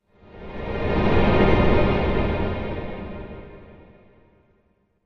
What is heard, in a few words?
Music Eerie Tremolo, orchestral